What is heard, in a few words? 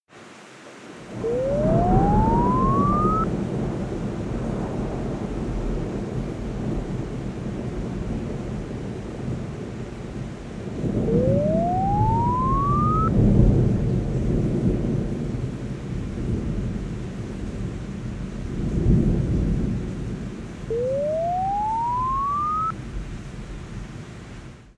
Rain and Thunder long weak